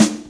SD, dance drums hip; 90 s Sample CDs; Best Service Dance Mega Drums

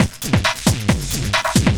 LOOP, Drum & Bass, 135 BPM; Ueberschall; Drum & Bass Flash Trackz

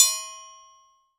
TRIANGLE, Latin Hand Perc; E-MU Producer Series Vol. 2; More Studio Essentials; Composer

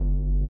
LIQUITAR; Spectrasonics; Metamorphosis

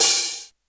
Roland U110 Rock Drums G#1

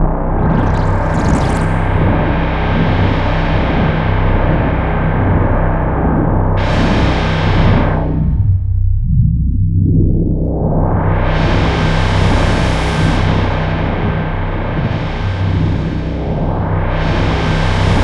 C1, Synth Line; 90 s Sample CDs; NiceBeats; SynthLine VOL-16

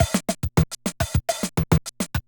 Loop, classic machines, rpm 40

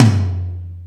Ambient Rock, E-MU Pro-Cussion

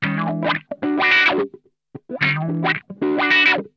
RUDE WAH, Funk Guitar; 90 s Sample CDs; Zero G

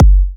Electro Kicks

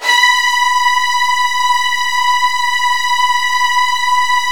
B3, Virtuoso Strings; ILIO; Kirk Hunter Virtuoso Strings VOL-1

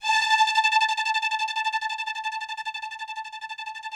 ROUGH STRNGS, Yamaha VP-1; Music Store

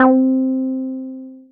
Synths BASS

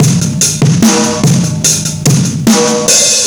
Loops, breaks

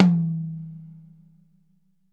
HIGH, Drumworks; Sampleheads - New York City Drumworks VOL-1

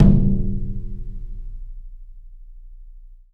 HYBRID, Heart of Africa; Spectrasonics; AF.HYBRID

